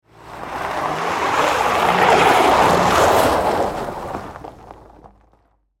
Tires Spin Donut, Transportation, Automobile, Tires, Spin, Donut, In, Snow, Ice, Crack; Saturn, Vue; Car; BlastWave